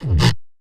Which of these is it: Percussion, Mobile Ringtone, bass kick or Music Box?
bass kick